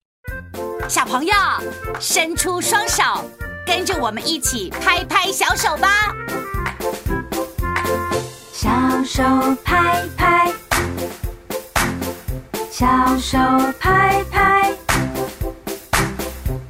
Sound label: music